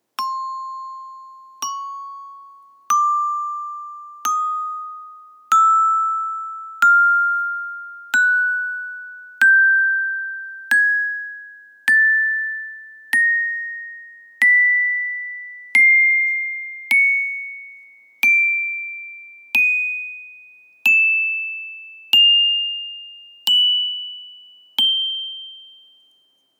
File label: Rubber Club